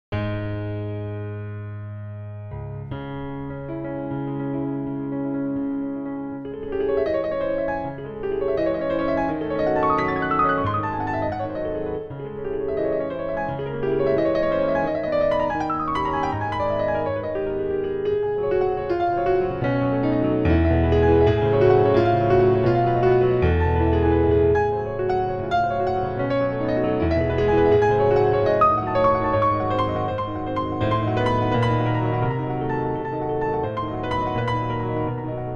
Venice Grand D274

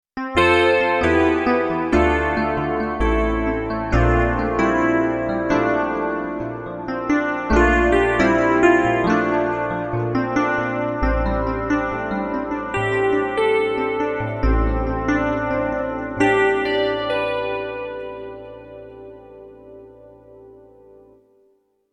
PCM EPiano

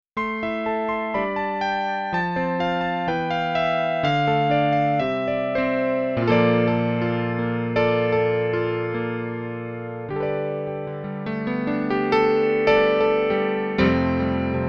Electric Grand 70BXL

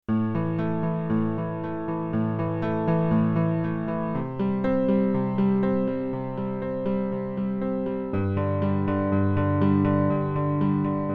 Venice Upright K8

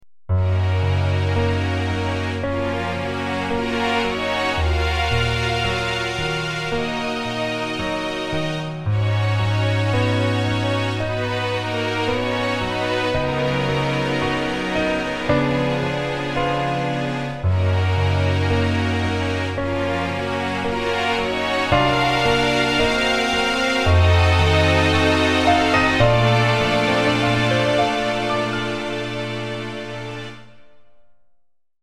Mini Orchestra

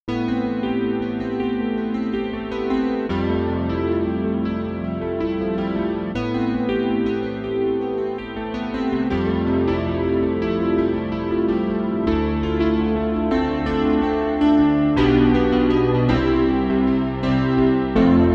Vertical Electric Piano

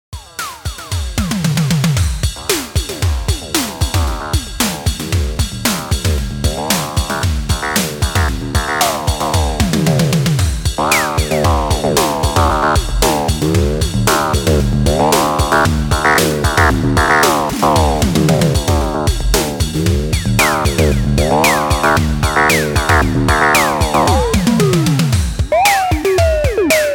Drum80

